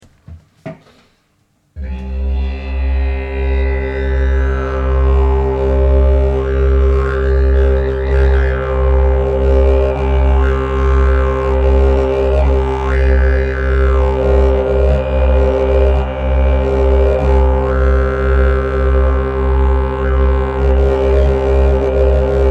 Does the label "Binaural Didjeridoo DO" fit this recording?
Yes